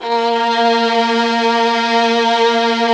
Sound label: TRON VIO A#1; E-MU Formula 4000 Series Vol. 3 – Analog Odyssey; Vintage Keys